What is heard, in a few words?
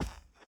carpet step; Golden Crayon Sounds